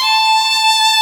violin; osu

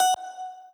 lead

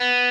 Arp.

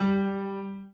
Piano Chord

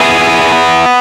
arp; osu

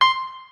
piano

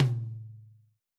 lo tom

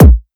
soft hitwhistle

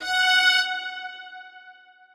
Strings kiai

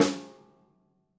snare

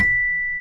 Celeste B4

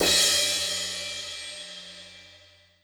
drum hit finish

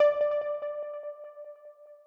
EPiano

